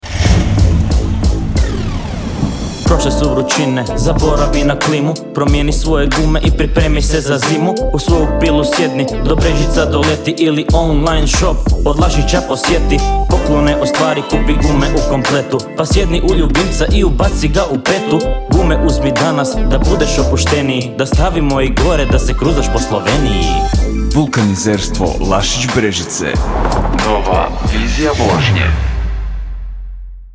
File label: audio jingle rap; Pripravljeni na zimo?